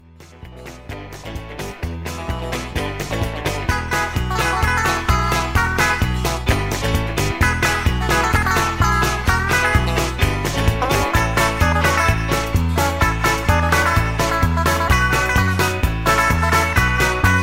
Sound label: Instrumental